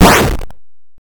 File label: Kill Enemy 8 Bit